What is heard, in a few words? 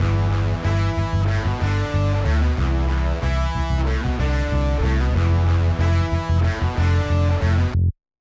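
Bass Guitar Combo; Multitrack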